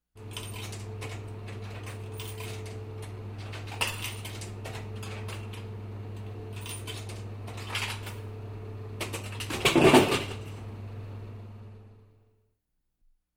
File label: Rumbling in Office with air conditioning